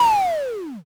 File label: power off